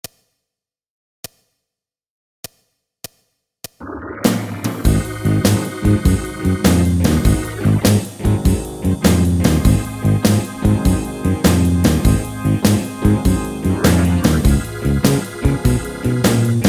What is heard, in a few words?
tenor